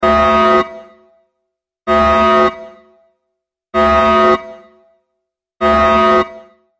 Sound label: Warning System Alarm